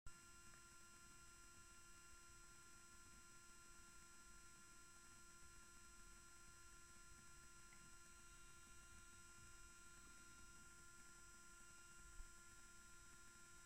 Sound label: hiss